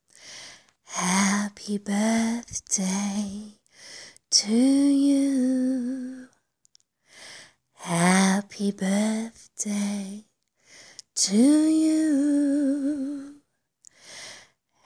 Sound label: sings Happy Birthday; Happy Birthday Anon!